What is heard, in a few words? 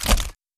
rifle clip out; br85